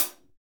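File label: hi-hat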